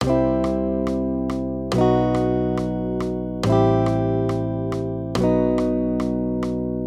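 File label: Accompaniment, Major Triads, C-B, 140 BPM, guitar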